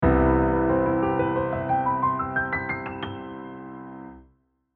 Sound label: arp CM7